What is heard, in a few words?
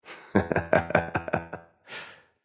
laugh; smite; susano